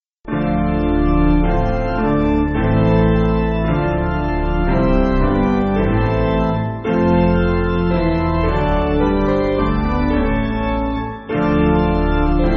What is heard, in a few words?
Piano, Music Backing; O God Of Truth; Martyrs